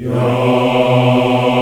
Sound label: B 2, CHOIR YAS, Africa; Spectrasonics; Heart of Africa 1